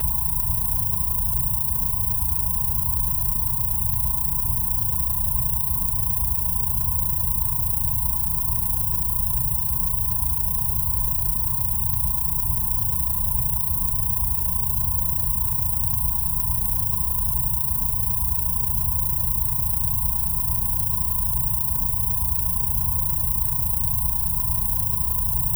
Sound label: noise soft rapid hot anxious